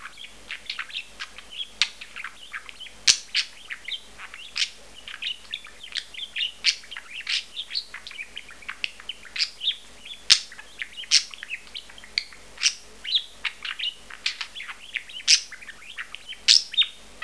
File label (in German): tschirp; Wellensittich